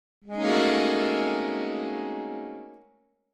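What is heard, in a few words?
Accordion Keys Tension; keyboards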